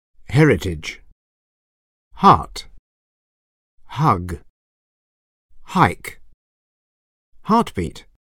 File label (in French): son techno